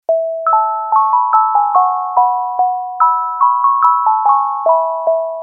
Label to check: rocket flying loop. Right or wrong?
Wrong